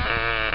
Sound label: open door; angband